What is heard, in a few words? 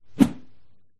woosh